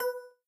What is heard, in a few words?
count Up Loop End; netent; kingof3kingdoms